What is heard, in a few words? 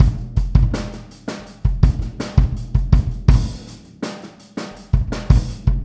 Boogaloo, Kick, 82 BPM; Drums; Verse; Sonic Foundry; Drums from the Big Room The Mixes